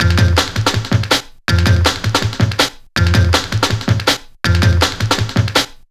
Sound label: 162, Jungle Breaks; Jungle Warfare 1&2